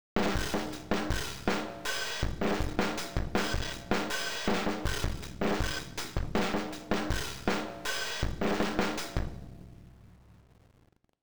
TRIP HOP DRUMS SOLO

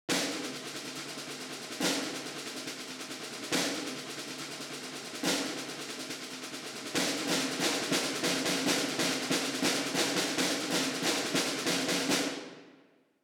ACTION STRIKE ONLY; KONTAKT LIBRARY

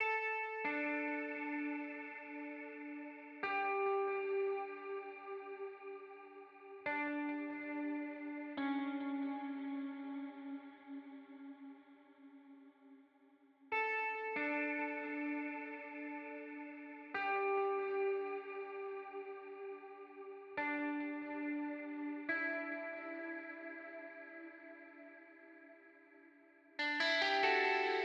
INTRO GUITAR; KONTAKT LIBRARY

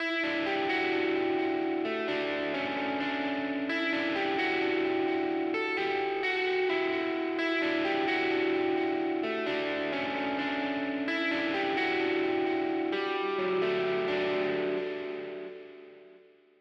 STEREO GUITAR RIGHT GT

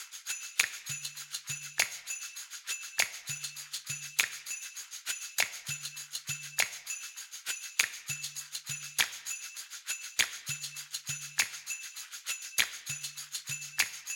FUNK GROOVE PERC ONLY

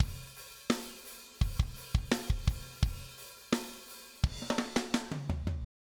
Basic Rock Fill wet

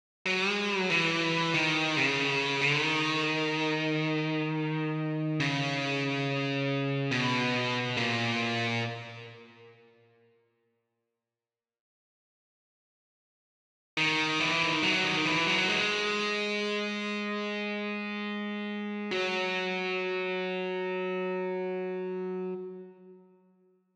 SONG GUITAR SOLO; KONTAKT LIBRARY

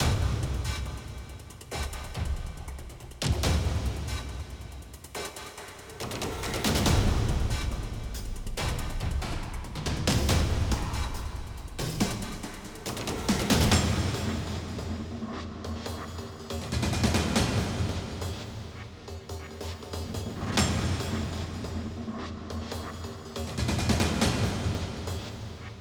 DAMAGE ONLY; KONTAKT LIBRARY

